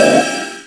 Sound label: Wiz poof; Word Wizard Games